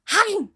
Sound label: Big Chomp